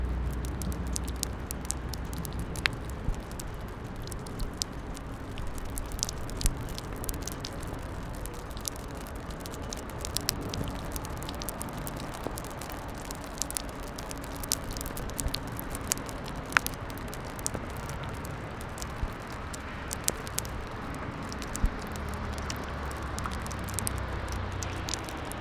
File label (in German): feine tropfen